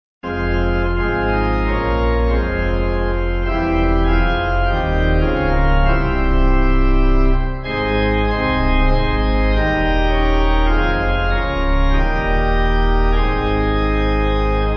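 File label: Pipe, hymn backing; O Heavenly Word; Uffingham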